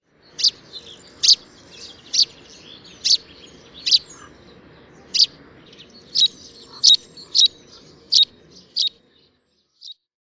mazurek, ptaki

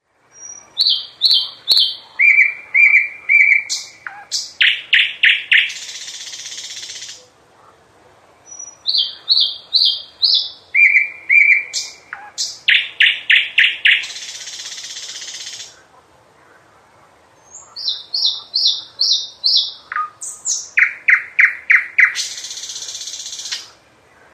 Ptaki słowik

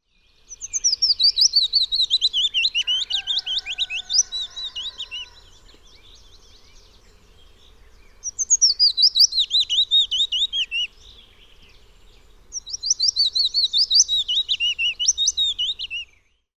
piecuszek, ptaki

